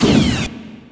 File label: shoot